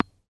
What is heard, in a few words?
hit wall and bubbles